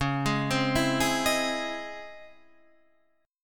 C sharp Minor Major 9th C sharp Guitar Half Step Down; C#mM9